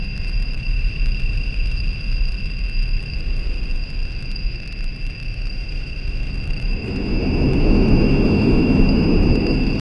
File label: ambient jungle at night